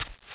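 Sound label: foot muffled; alienbreed3d2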